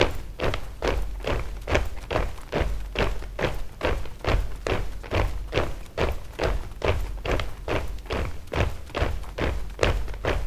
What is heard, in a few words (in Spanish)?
Marcha Militar 140bpm